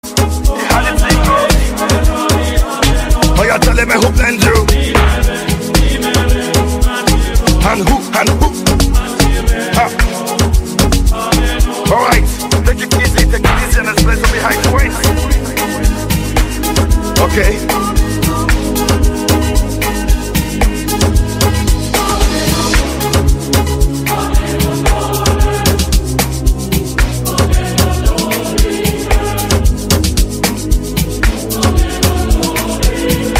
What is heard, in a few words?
Amapiano, ringtone